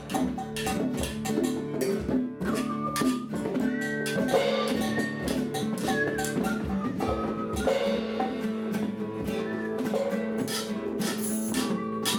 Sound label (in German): dosenschlagzeug gemischt